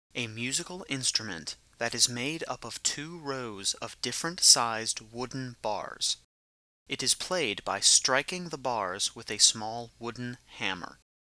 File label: xylophone